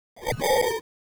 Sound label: tone